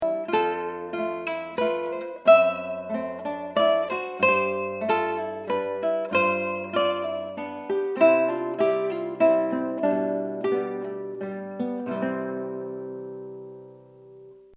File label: waltz